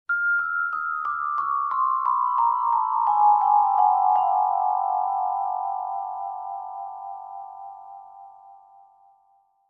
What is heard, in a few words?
Soft mysterious mallet scale down